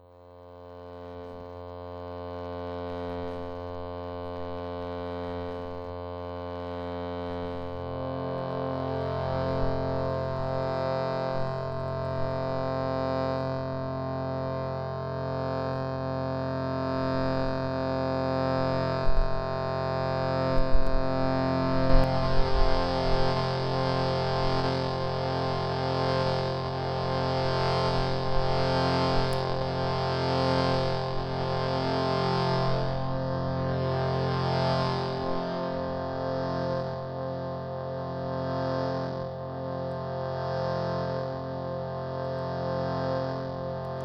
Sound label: shrutibox f